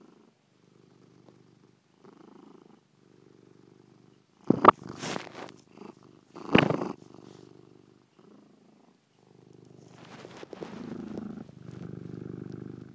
purry cat

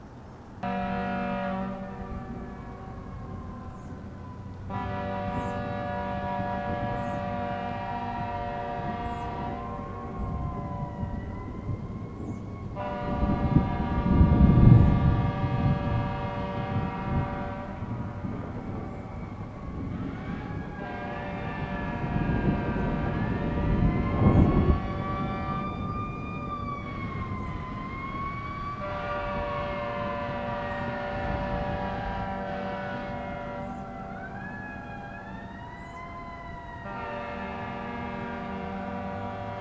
sirens emergency horns

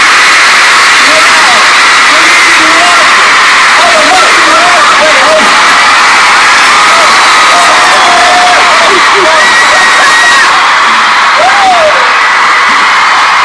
screams

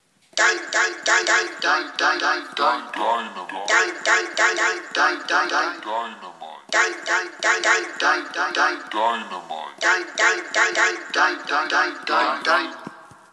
audio jingle sampled with garage band

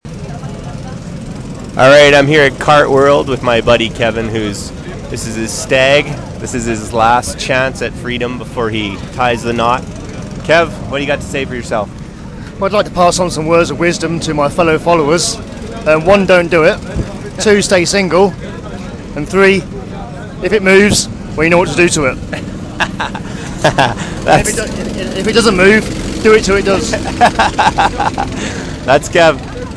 go karting; at kev's stag

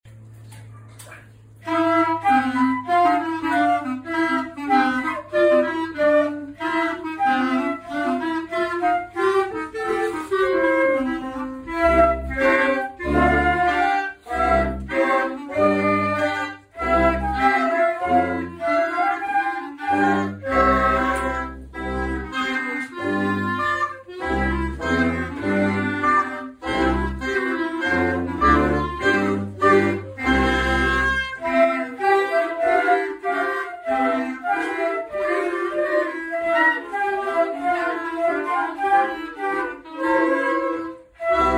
orchestra wind

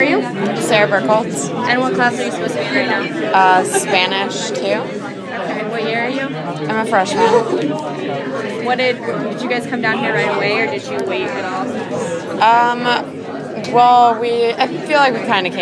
tornado drill

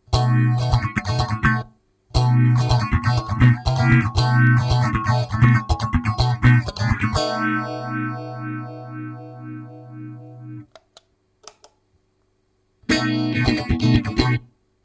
morley phaser one and ibanez fp 777 test

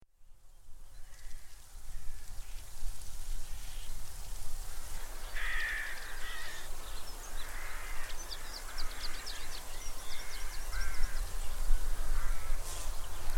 bee and birdsong at ringstead

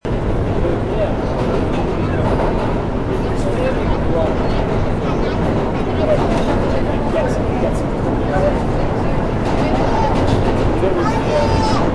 NYC subway E train

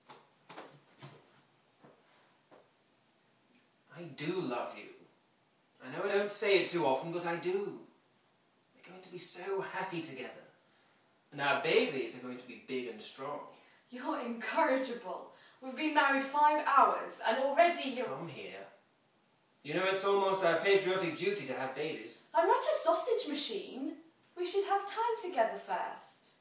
recording at rehearsals

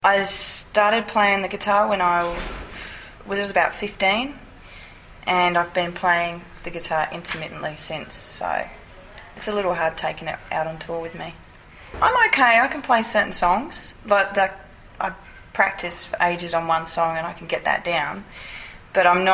can play the guitar

